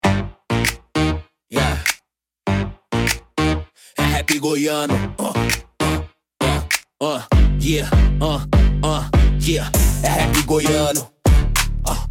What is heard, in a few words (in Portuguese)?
rap goiano